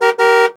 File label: push sound